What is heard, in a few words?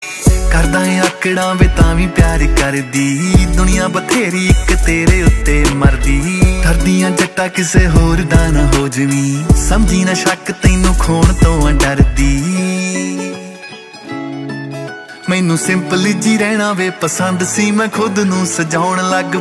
Punjabi Ringtone